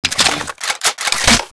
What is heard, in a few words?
m202a1 reload